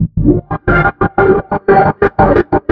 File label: ORCHFX, orchestra, Asian Traditions